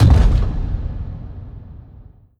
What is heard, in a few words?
distant step, strider steps; creatures; Half Life Model Pack